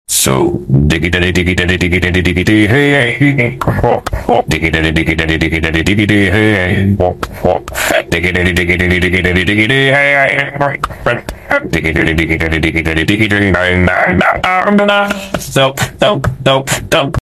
singing DIGIDI DIGIDI; Baldis Basics

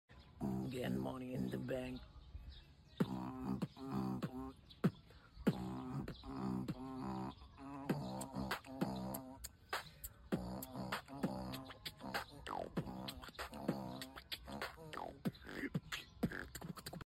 Tung Tung Tung Sahur is beatboxing; Sheriff

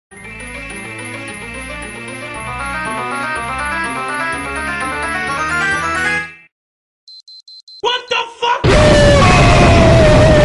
Beep Beep WTF Boom Scream

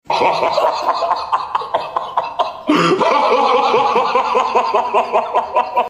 Tabby cat laughing; Meme Sound Effect

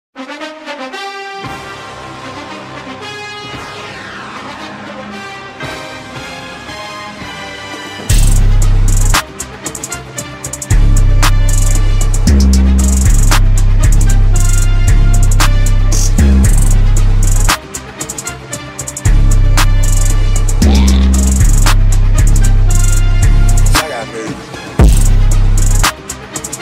freestyle instrumental; ishowspeed; nfl; Voicemod

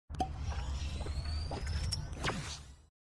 Shield; Fornite

